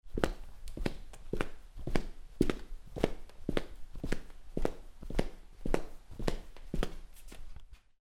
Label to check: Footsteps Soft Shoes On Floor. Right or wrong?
Right